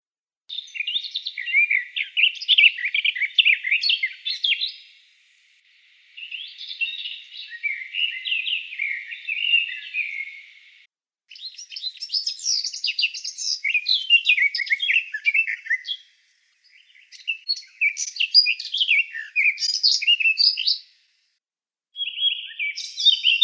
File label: Sylvia atricapilla